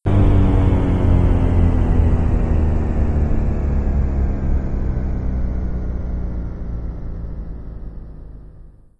machine room stop

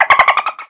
hit, weapons

